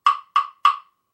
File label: woodblock